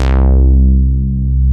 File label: MOOG, Source Bass